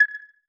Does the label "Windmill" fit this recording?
No